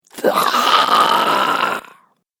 Zombie Gurgle Growl; Zomby Sound Effects; horror

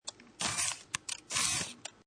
Camera Motorized Zoom Lens Movements; Cameras (Sound Effects:Electronics)

